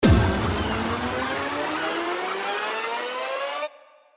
Scatter Anticipation; Pirate Quest

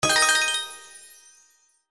Scatter Wins; Gem Fortunes

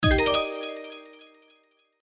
Win Low; slots; St Paddys Day Blast

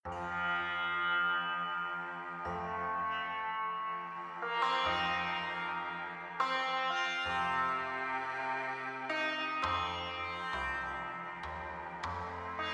BGM Paytable, slots; Majestic India

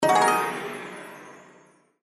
SFX Winning Image, slots; Panda Majesty2

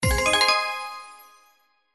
WILD WIN; Lucky Wok

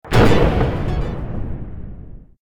Win Animation; slots; Cash Honey

